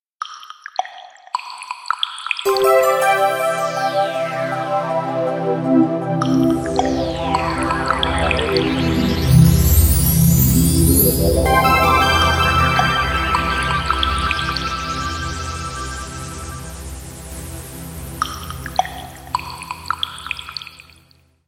echo; Hisense U961

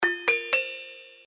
Tri Tone; TP-LINK Neffos C5

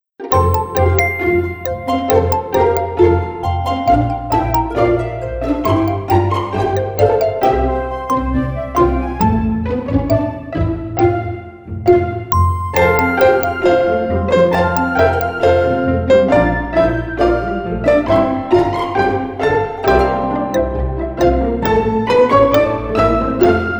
Ringtone; Gionee GN137

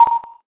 Camera Self End, Samsung SGH-i300

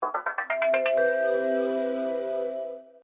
Startup; UTStarcom; CDM-7126

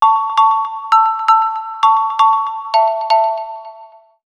Mallet Reminder; Sony Ericsson; Xperia X1

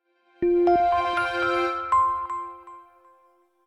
Power On; Samsung Galaxy-E5

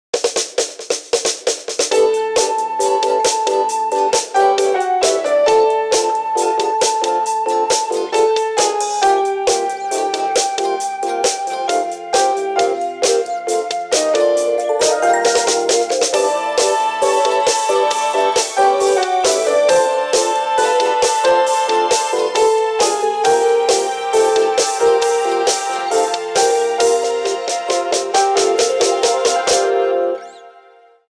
bike travel, ringtones

